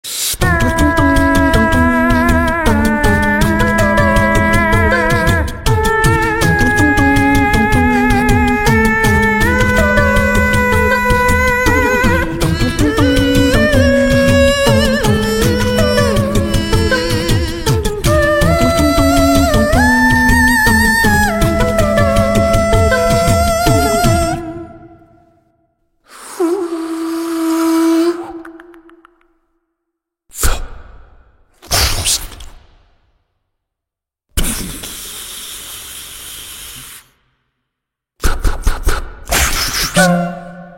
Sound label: Acapella; Fruit Ninja